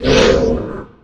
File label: Pickup Speed